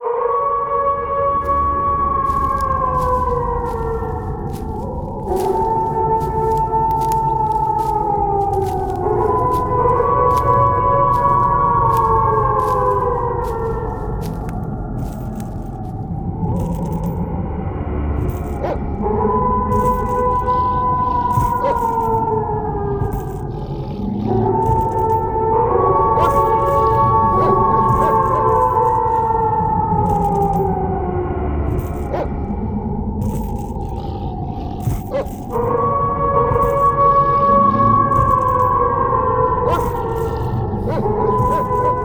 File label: Howling Wolves; Halloween Ringtones and Scary Sound